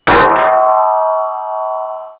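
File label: Rivet Hit Interior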